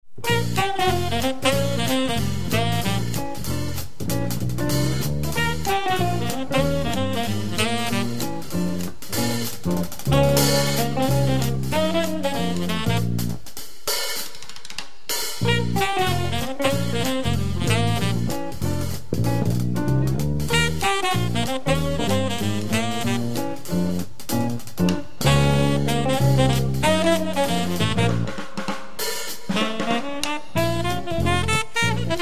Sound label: Spring Blues